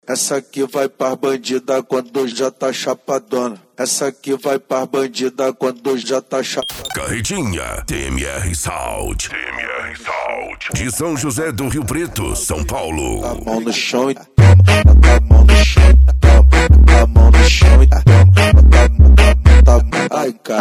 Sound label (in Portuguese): esp insane sound